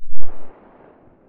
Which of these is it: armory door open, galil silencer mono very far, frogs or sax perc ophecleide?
galil silencer mono very far